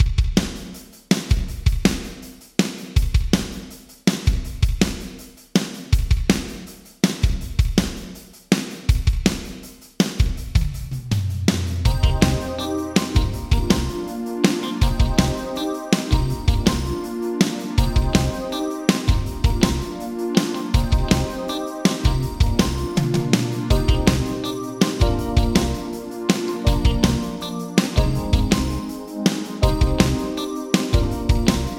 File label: Drums Yamaha Rev7 Small Hall 1.0s Vintage Digital